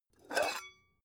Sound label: pan open short